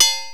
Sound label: TANG